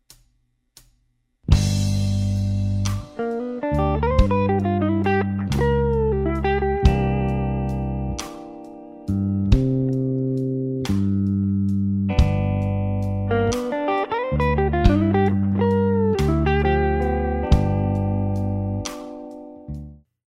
G Slow Blues Lick, guitar; 19 Blues Licks

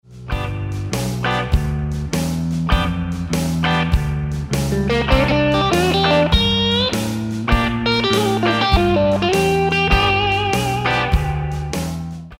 Reconsider Lick, guitar

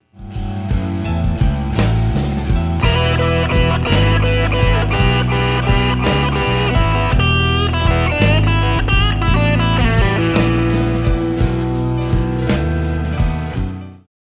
E Blues Lick, guitar

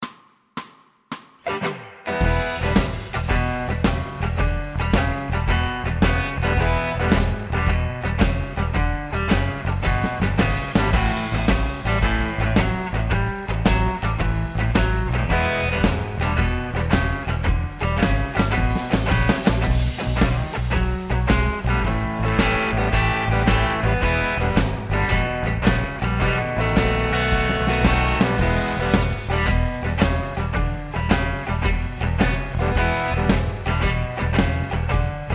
Texas Walking Solo

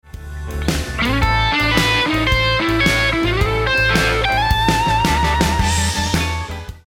Licks Lick, guitar; Beginner/Intermediate Shuffle Blues licks

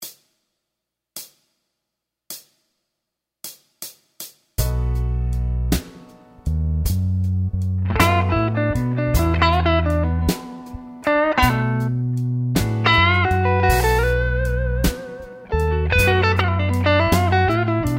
Three O Clock Solo, guitar

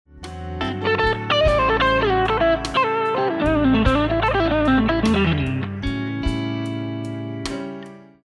Blues Lick, guitar; Blues Guitar Method 1

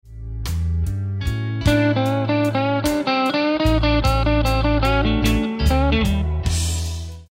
Lick, guitar; Beginner/Intermediate licks; Blues Guitar Method 1

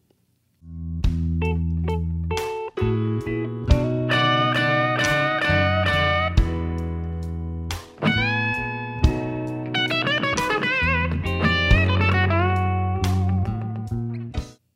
Slow Blues in G Lick, guitar

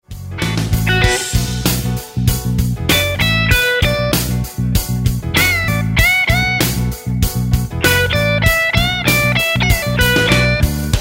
Funky Lick, guitar

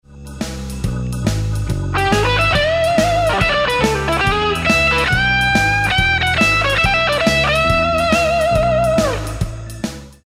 Lick, guitar

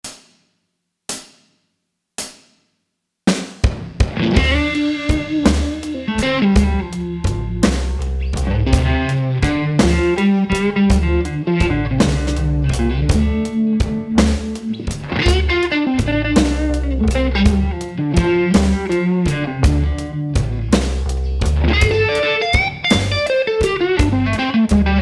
Train Solo

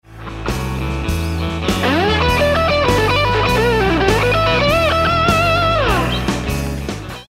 Lick, guitar

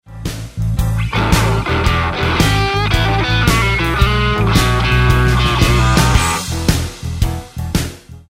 Roadhouse Licks Lick, guitar; 20 Roadhouse Licks

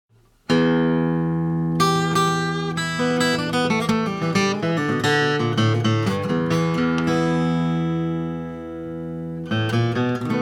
Performance, blues slide solos

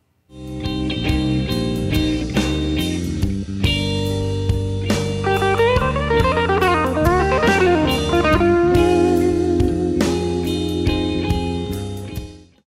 C Minor Blues Lick, guitar

